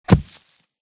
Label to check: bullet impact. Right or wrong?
Right